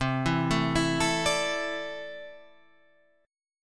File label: D 7th Suspended 2nd, C